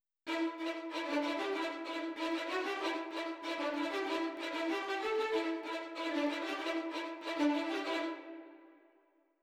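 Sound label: violins marcato; combat results win; Netease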